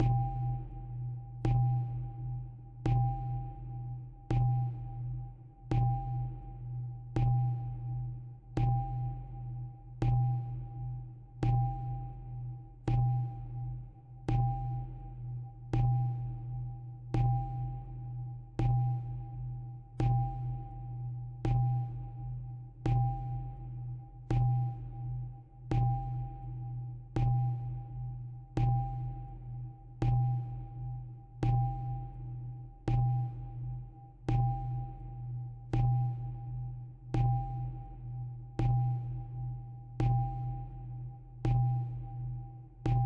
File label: Calming